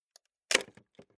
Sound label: Splitting Wooden Crate With Hammer Crowbar Quick Break Tearing; Wood Sound Effects